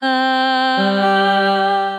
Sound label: Chromatic Voice; Voice Box; Chromatic Voices